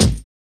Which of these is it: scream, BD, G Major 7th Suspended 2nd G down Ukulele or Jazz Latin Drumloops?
BD